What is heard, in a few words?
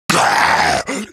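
death, zombie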